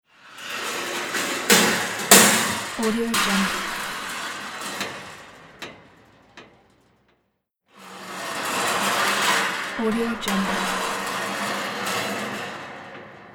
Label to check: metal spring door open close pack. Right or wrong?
Right